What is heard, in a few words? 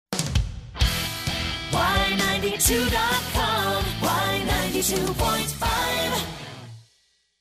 jingle dotcom